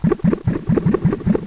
Space Bus Engine; Let's Discover Michigan!

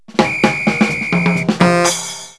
Level complete; Pop the Wheezil

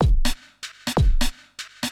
PERCLOOP HOUSE 125; eJay

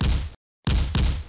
shotgun

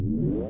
menu appear; Stunt GP

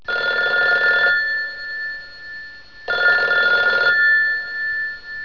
phone

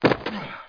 player land; Daikatana

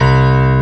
PIANO; DirectX6

